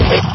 shift down

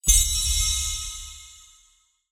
bell chime

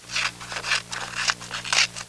scissors cutting paper

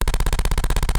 machinegun fire

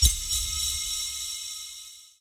magic bell

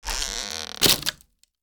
wood break tension

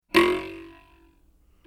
boing

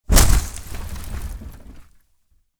car impact

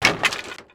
wood debris drop

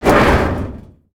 metal sheet wobble

